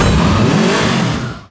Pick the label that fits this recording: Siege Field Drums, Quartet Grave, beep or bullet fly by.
beep